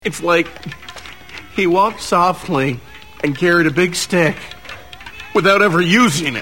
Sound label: stick